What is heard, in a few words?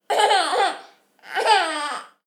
baby laughing